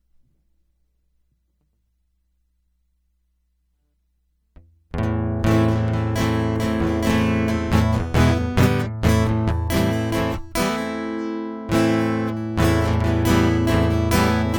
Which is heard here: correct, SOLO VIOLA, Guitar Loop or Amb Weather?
Guitar Loop